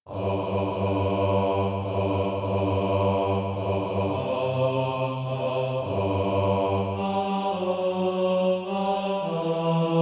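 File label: bass, Practise Music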